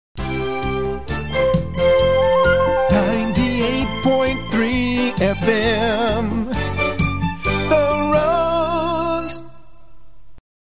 jingle; WWRZ

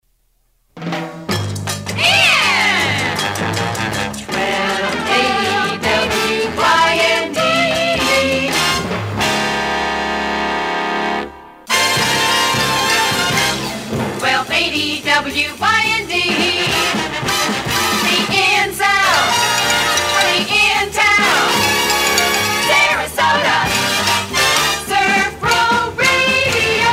Jingle medley; WYND

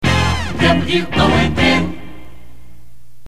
jingle; WONN

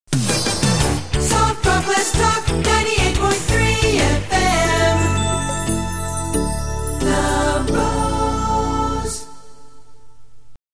jingle; WWRZ

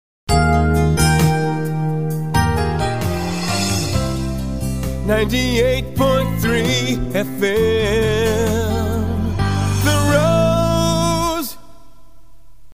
jingle; WWRZ

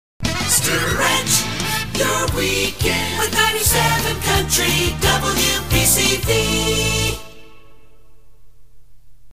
jingle Stretch your weekend; WPCV

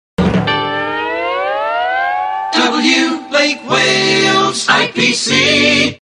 jingle; WIPC; W Lake Wales IPC

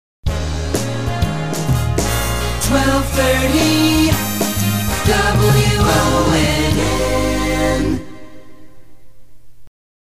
jingle; WONN; 1230 WONN

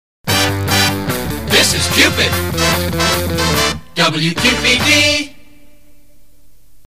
jingle This is Cupid; WQPD

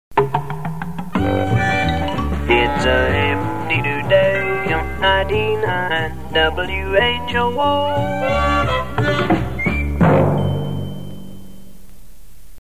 sonovox jingle; Its A Hip A Dee Doo Day

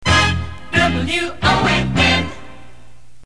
jingle; WONN

